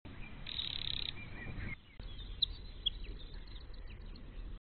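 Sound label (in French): Troglodyte 0-4, chant de troglodyte; non filtré; (BP : 0 à 20 kHz) et échantillonné à 8 kHz; Chant de troglodyte n° 1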